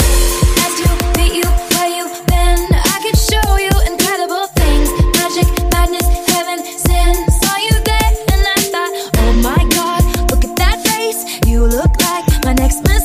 105 bpm F

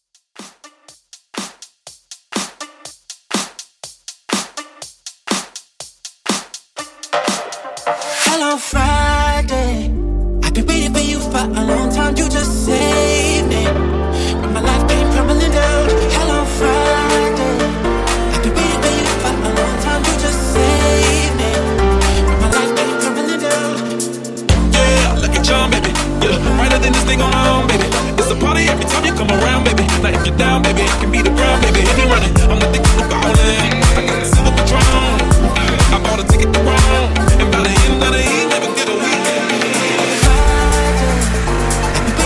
Short Club 122Bpm Clean

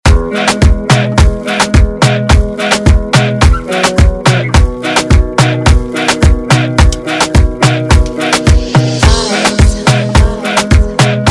Moombahton Redrum Intro

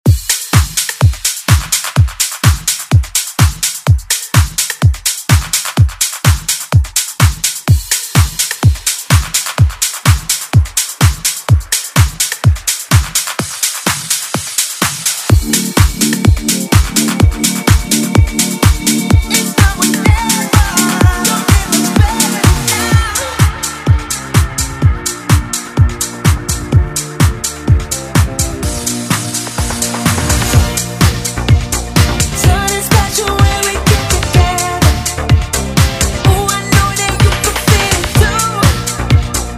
House Redrum

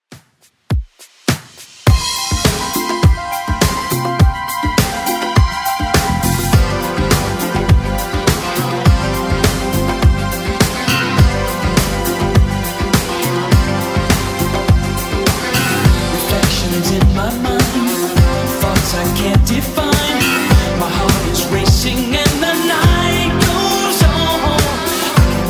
80s Redrum 103bpm

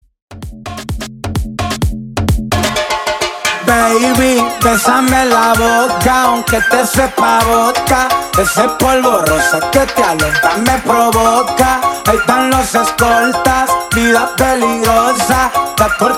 129 BPM; Harley Quinn; remixplanet

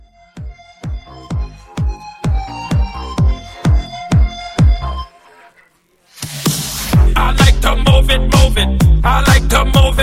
House Vocal 128 CLEAN

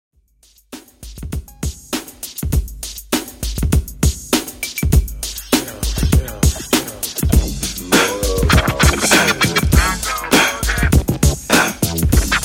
80s HipHop Redrum 100Bpm

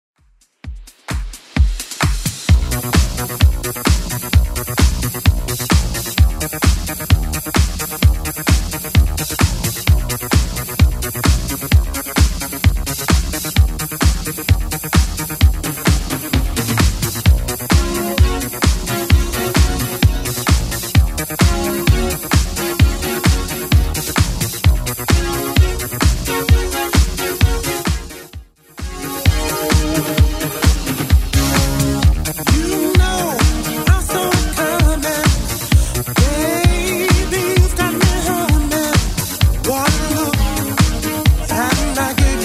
80s Club Edit 130bpm

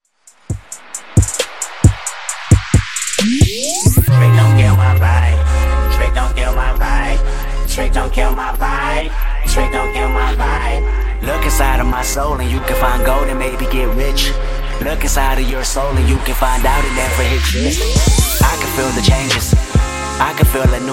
134Bpm

